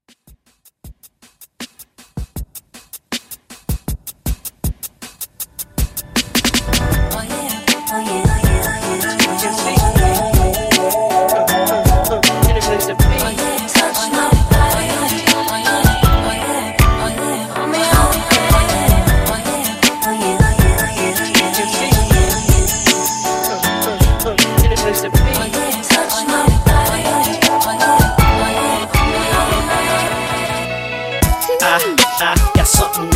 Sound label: Old R B Blends Starter 158 Bpm